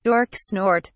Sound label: snort